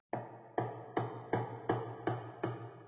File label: Amb Pigcity Hammerhit